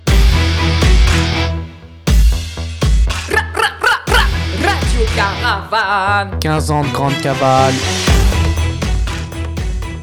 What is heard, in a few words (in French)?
jingle; RC 2025; cavale